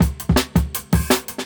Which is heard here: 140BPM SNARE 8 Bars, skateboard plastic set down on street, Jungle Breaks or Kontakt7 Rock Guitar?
Jungle Breaks